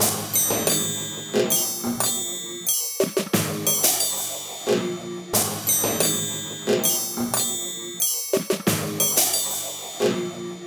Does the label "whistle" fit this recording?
No